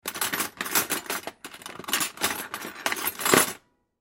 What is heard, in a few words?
Dishwasher Silver, Dishwasher Silverware Movement; Whirlpool Appliance; Household; Appliances